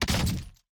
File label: hit; EMXArms